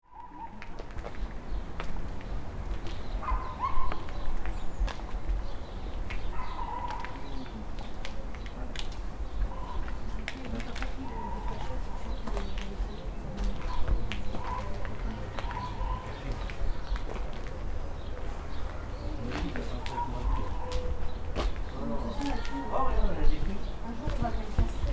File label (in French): territoires inondables intimite sonore